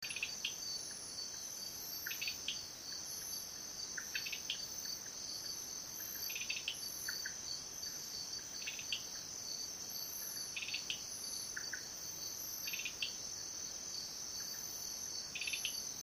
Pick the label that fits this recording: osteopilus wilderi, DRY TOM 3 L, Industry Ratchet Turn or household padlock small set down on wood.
osteopilus wilderi